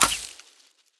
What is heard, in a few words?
berrybush hurt, player hurt; Minecraft; Votive World